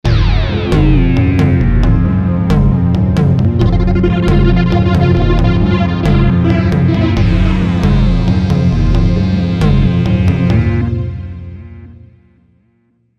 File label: Novation Supernova